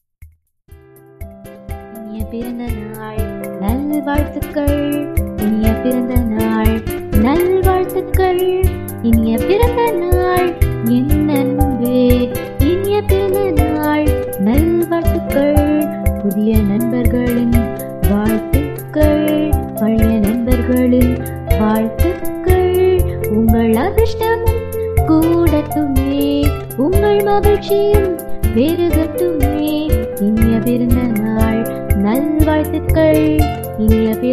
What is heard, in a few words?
tamil happy birthday song